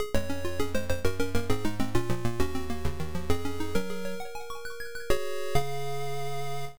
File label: Level Complete; Relevos Snowboarding; MSX; Alternative, Unused